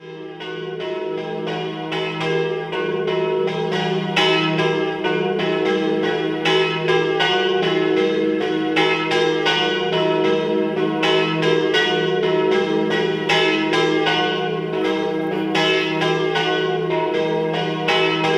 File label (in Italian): Vallenoncello, campane